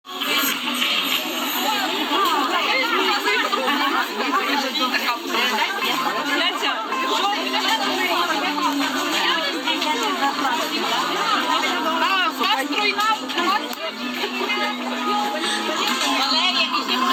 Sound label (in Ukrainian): gamir sorochynskogo yarmarku